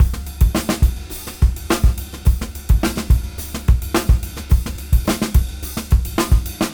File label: Junky Funk 107 BPM